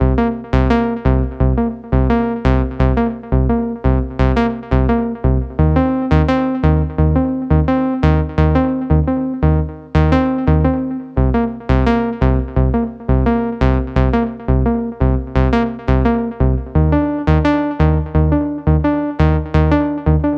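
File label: synth loop pluck, 172, C major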